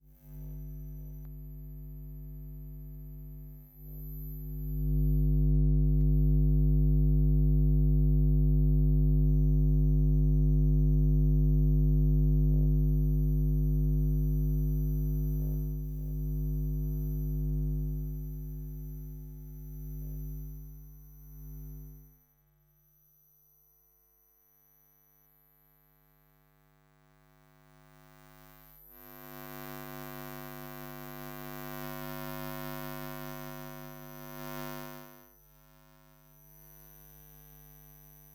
Electromagnetic Fields, Hummin; electric fields

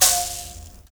MOUTH BOW LO, Heart of Africa

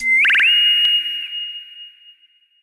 SHORT, SHORTNOIS

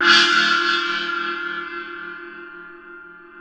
NEPAL CYM, Asian Cymbals

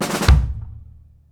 KICK SNARE, Live Snares

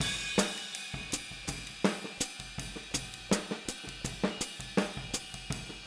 Boogaloo Chorus Hi-Hat, drums, 82 BPM; Drums from the Big Room The Mixes

